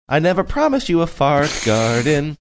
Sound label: singing i never promised you a fart garden